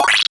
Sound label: menu; honculus; TRUTH-HZ; Truth-Hz - 1-Hit KO Sample Pack